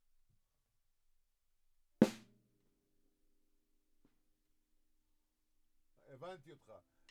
Drums

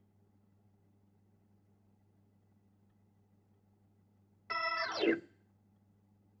Hammond

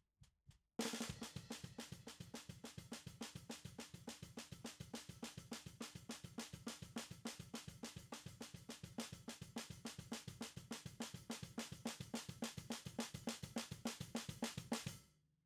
SNR BTM

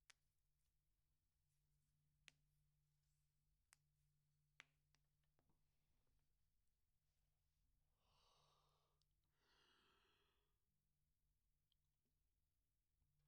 SNR Top

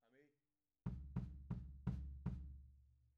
Floor

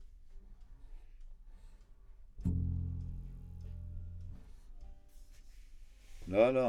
C Bass

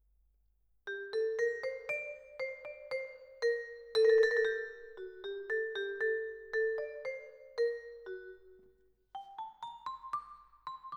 Vibra, Twin F

